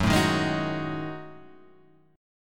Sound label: F sharp Minor 6th Add 9th